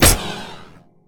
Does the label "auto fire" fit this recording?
Yes